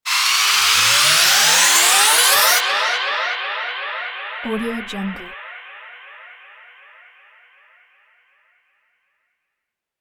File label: alien invasion sound